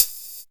Gated Kit, Percussion

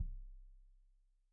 Kik Stereo; Drum; Deeply Sampled Drums; SMDrum

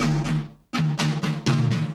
Dance Grooves

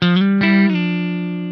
INTERVAL, Funk Guitar; Zero G - Funk Guitar

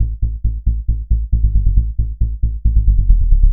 SoftSub 136 A, bass; musicradar; bass boutique

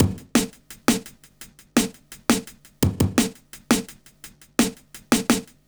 170 break, Explosive Jungle

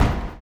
STOMPER; Spectrasonics Vocal Planet

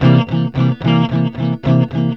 ROCK C 110, Vintage Blues Guitar; USB Soundscan vol.22; AKAI

